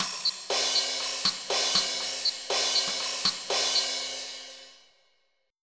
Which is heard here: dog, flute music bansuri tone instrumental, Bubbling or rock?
rock